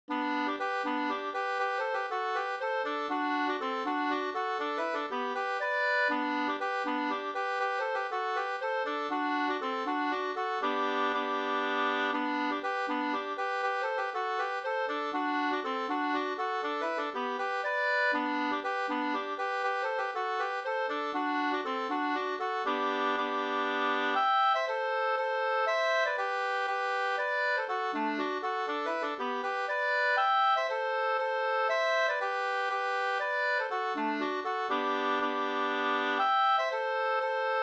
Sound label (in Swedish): polska; fr Farna av Majken Berglin